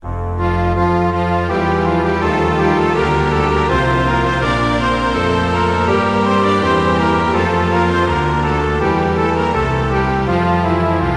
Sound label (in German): spielmusik orchesterpartitur